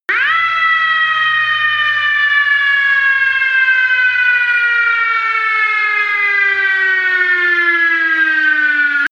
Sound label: fire truck siren us